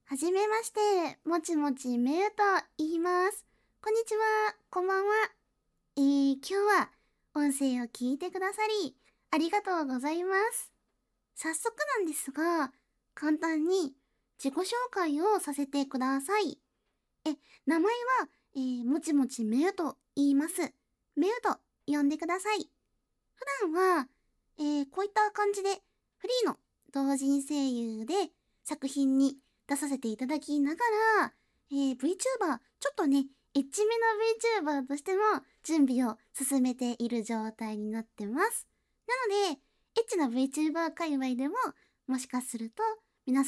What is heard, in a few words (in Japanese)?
ガチ排便